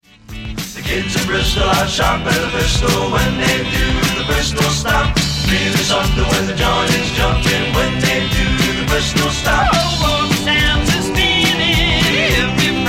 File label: Stomp